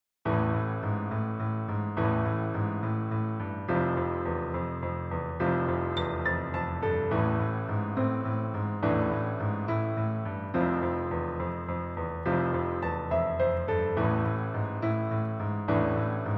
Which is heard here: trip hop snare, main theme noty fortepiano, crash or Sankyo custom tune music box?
main theme noty fortepiano